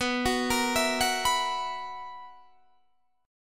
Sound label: B Major 7th Suspended 4th B